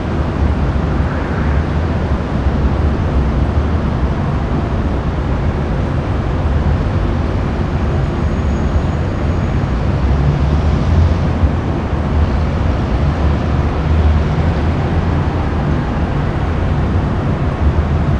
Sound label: city; Dystopia; vaccine